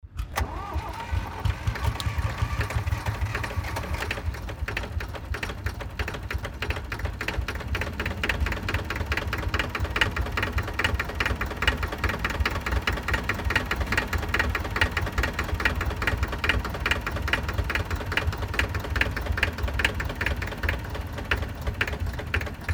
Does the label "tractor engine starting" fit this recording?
Yes